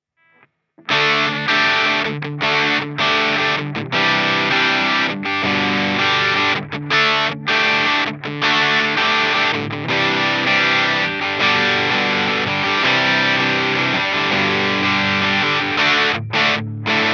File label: high gain, T-REX Crunchy Frog